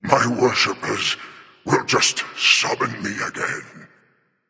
death; cernunnos

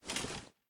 mvt armor; serqet; smite

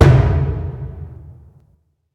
Taiko Mid; smite; raijin

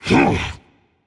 grunt jump rage; chaac

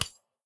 clap; Smite; Tiamat

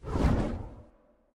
wingflap; smite; thanatos

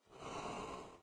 idle breath in; smite; odin

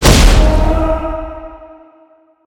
impact kill; smite; scylla

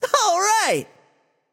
yay; smite; tsukuyomi

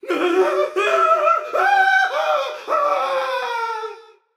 fear, voicepack; smite; jorm

